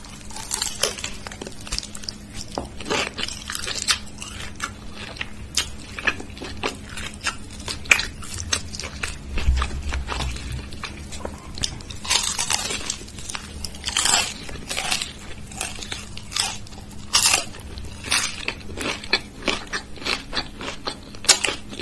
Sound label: eating sound